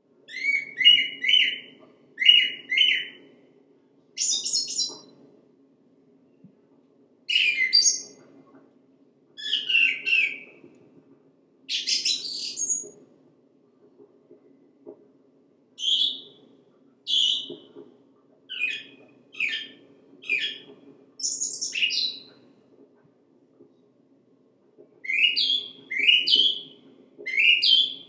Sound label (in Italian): tordo; I 7 CANTI